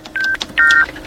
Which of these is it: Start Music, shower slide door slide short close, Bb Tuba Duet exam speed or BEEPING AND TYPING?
BEEPING AND TYPING